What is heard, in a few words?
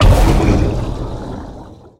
torpedo impact